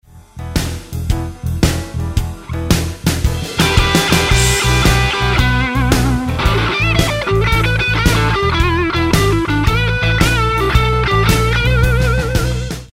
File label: Roadhouse Licks Lick, guitar; 20 Roadhouse Licks